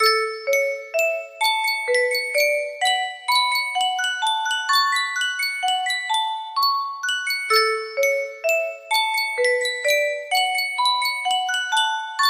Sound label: yunsheng custom tune music box